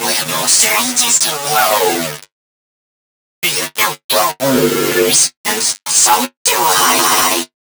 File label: Click Tick Game Over, Circuit Breaker